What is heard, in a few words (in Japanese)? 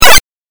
8bit ダメージ